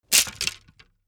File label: wood sticks small break